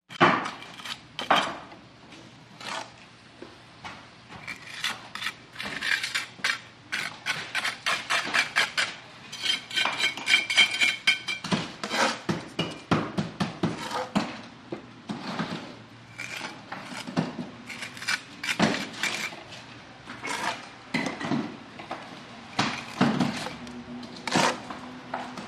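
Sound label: Grouting Cement Brick, construction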